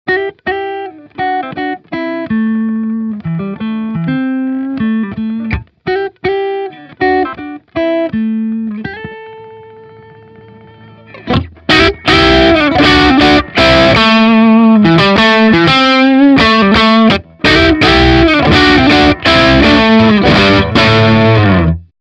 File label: COYOTE Dyna Pick; TEYE